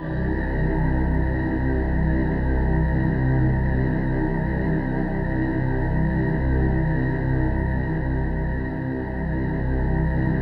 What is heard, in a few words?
C1, Synth Leads